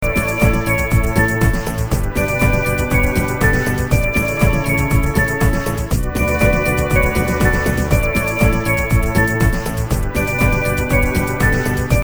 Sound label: depressing rock music